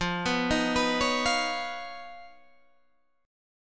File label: D sharp 7th Suspended 2nd Sharp 5th F